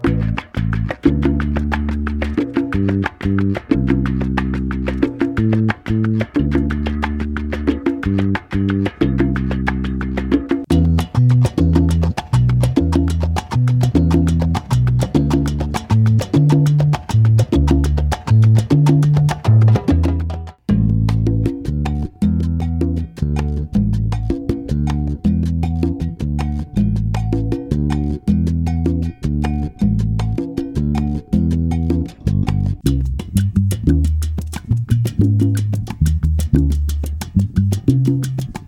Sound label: sample Afro Cuban Bs Dr